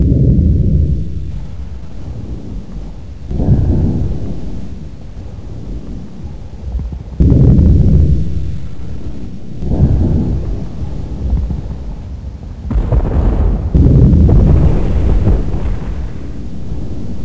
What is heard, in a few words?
brick ambience; dod